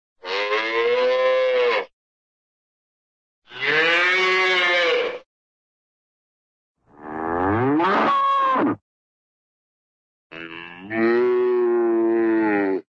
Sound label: cow calf moo, animal; cartoon